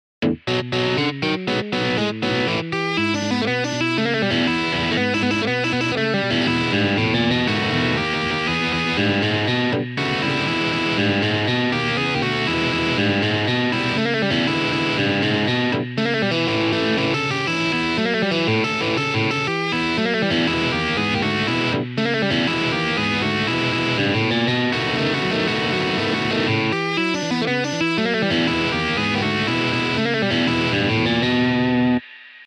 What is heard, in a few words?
Bluesrock 12 Bar Blues